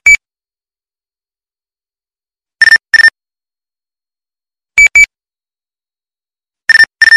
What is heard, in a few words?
Nextel; toque de celular